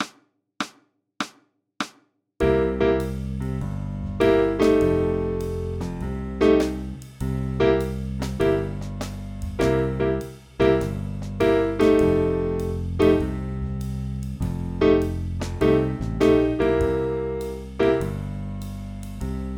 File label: Blues Style